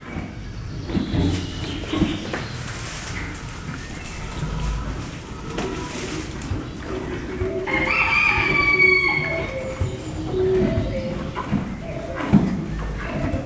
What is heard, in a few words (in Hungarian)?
istállóban eszik kakas kakukk, magyar parlagi szamár; miskolci zoo 2009